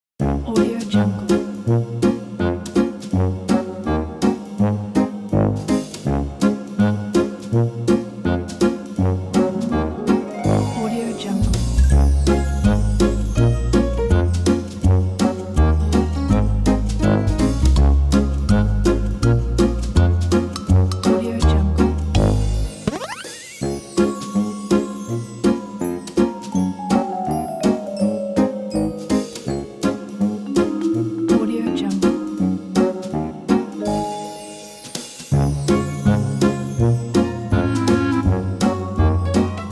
comedy jazz; musicdog

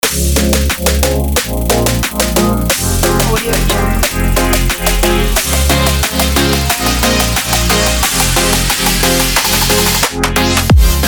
edm pop

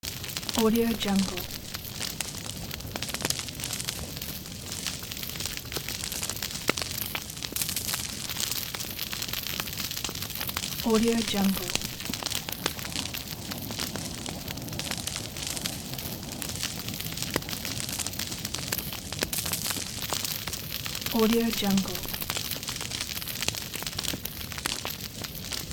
cracking of burning tree

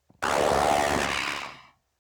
robot scream processed short screams moans

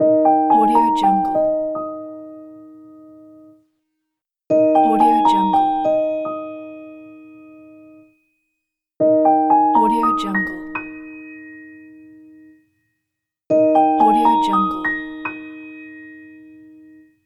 bright piano logo

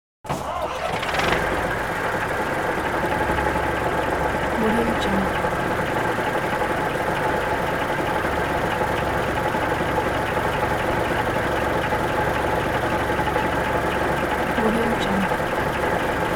bog cutter idles and goes away

